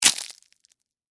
Crack Crunch Short, Texture, Crack, Crunch, Short, Ice, Cream, Cone; Food